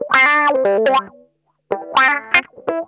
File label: WASPISH, Funk Guitar; Zero G - Funk Guitar